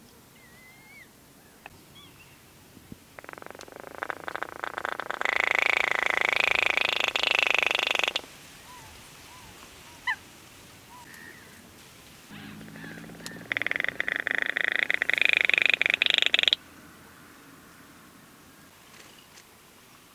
kleinst waterhoen zang

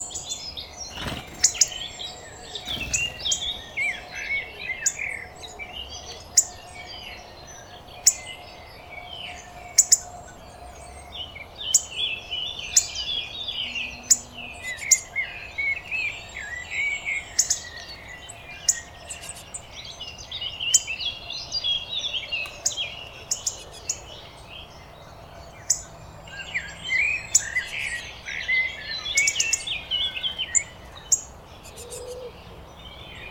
appelvink roep

